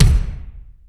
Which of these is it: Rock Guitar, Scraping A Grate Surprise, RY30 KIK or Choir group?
RY30 KIK